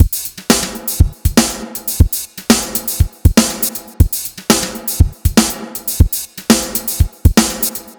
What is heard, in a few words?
Drummed